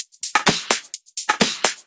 Electro Loop 128BPM; Vengeance Samples

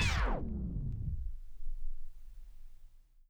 back spin short, vinyl record

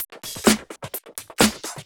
Houseloops 128BPM; Vengeance Samples

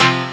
Synths F; vengeance essential club sounds-3

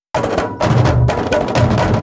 Fill 128 BPM; Vengeance Samples; VEH1 Loops

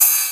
Ride, Cymbals; Vengeance Samples

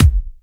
Soft Kicks; Vengeance Samples

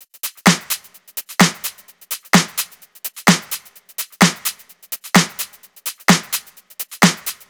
Entourage Drums 2, 128BPM; Vengeance Samples; Entourage Kit

